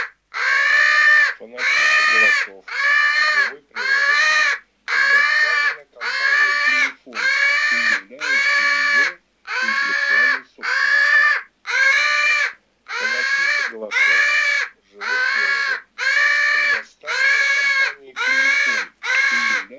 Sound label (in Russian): Грач крик Corvo grido